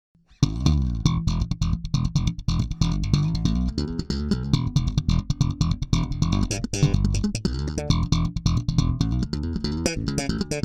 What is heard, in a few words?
PRS Grainger 4 Strg. Slap